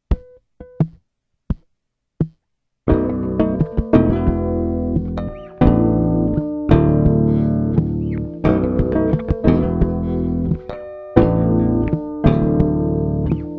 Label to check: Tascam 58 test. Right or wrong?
Right